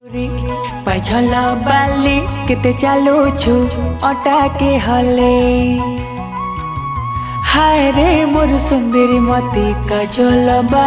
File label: Sambalpuri Song